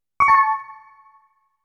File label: Game Menu (Cancel); game music; .hack; Game Music Perfect Collection